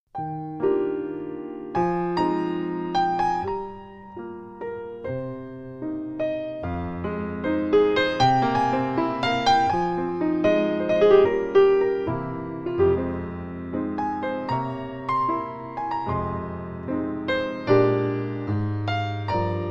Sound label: Piano